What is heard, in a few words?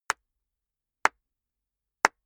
clapping very slowly